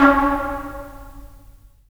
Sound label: BRS, Tps Stacc; Roland - LA Composer vol. 4